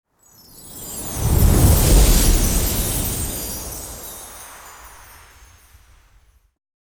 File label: Magical whoosh transitions christmas slow transition